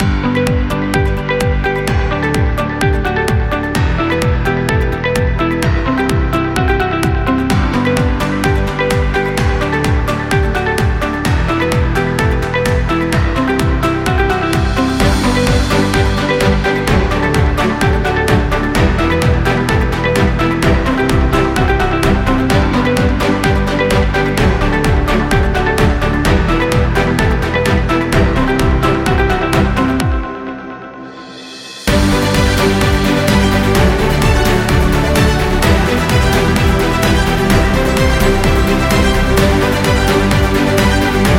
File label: Pop Art